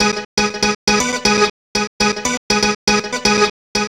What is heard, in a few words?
Synth